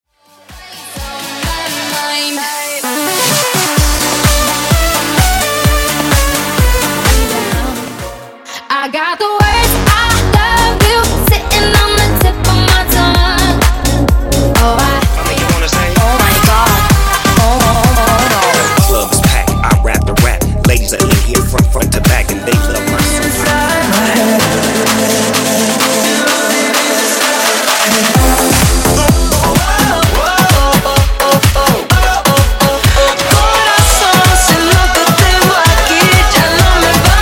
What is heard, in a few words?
Party Dance Club Megamix, 128 Bpm